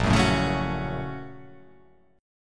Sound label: A sharp Minor Major 7th Sharp 5th, down